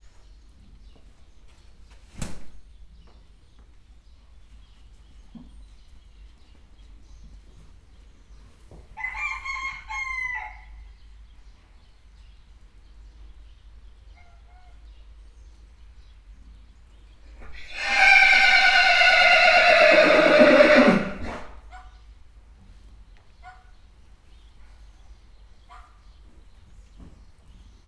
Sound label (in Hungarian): másfél méterről nyerít, póniló; állathangok; nyíregyházi zoo 2010